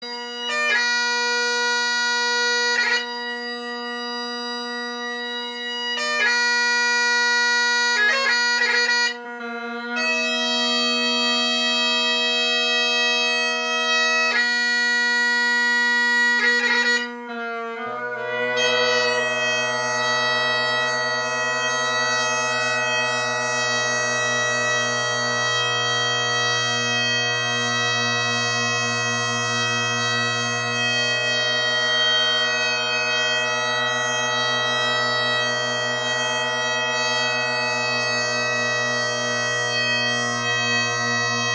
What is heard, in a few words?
bad bass match carbon, Drone Sounds of the GHB, Bagpipe